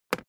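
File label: briefcase plastic handle bounce hard, Büroarbeit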